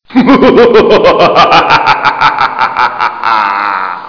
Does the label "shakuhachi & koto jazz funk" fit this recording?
No